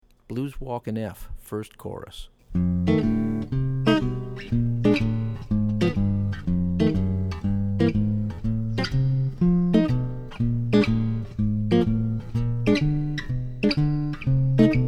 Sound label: In F, 1st Chorus; jazz chording